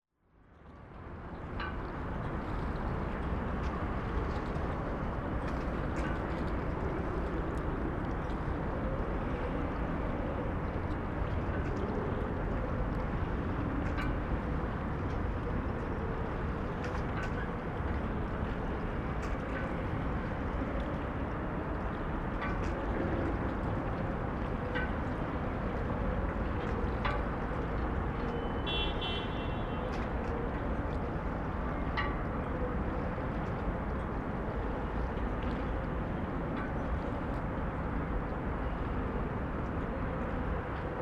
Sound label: Wharf; Trinity Buoy